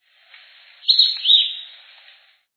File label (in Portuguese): arapacu de bico cunha